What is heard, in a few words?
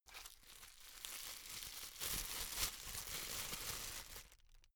plastic bag unfold slow